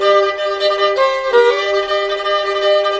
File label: violin; fiddle